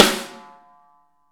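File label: snare ambient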